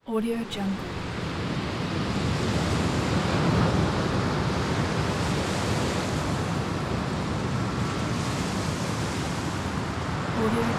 storm

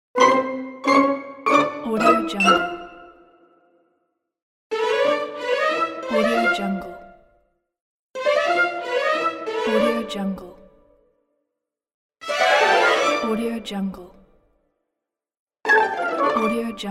funny cues

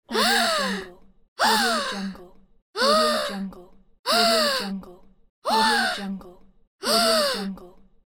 female child surprised gasp

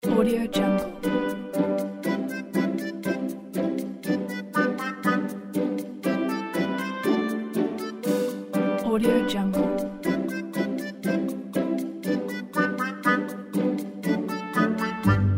quirky funny playful comedy

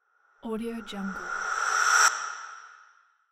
sweep transition; bogdanbogdanov

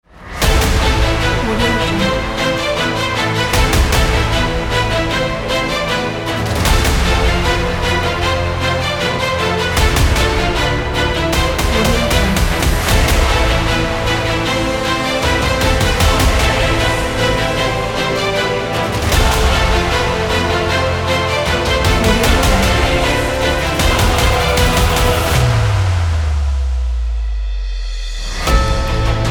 epic majestic trailer

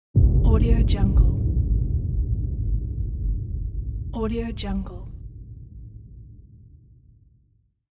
the boom

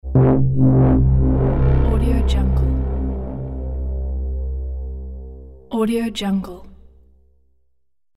dark transition

